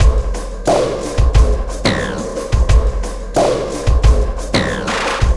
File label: Sky Lab Beat, Processed Drumkits